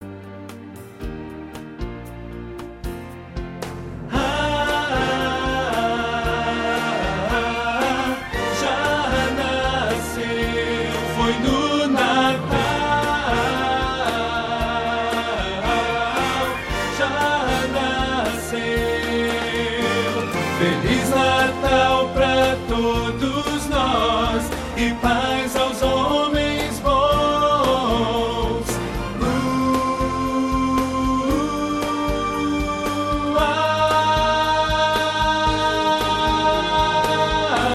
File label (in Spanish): Ho Amor de Dios Nacio Tenor; cantata navidena